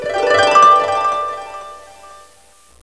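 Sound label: HARPE C O D, Instrument; MUSIC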